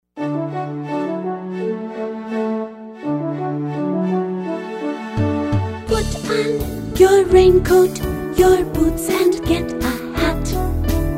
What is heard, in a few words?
quack quack